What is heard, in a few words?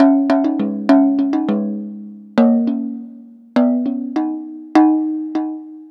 Jug Hi Melody; Ambient; Melodic; Ambient-Melodics